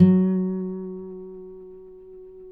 finger F#3, Guitars; Spectrasonics; Hans Zimmer Guitars VOL-1

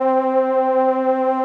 SOLINA, String Machines

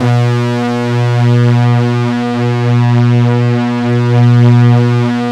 SYNC DEEP, PADS; Masterbits; Vintage Voltage

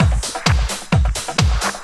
groove, DRUMLOOPS

